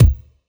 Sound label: bassdrum 120bpm 1 beat; Beatbuddy; Wii U